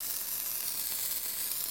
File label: super cannon fuse; aronwk collection; ndaudio